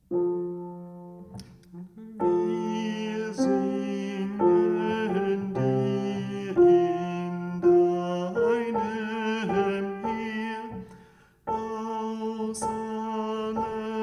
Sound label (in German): Alt, bach choraele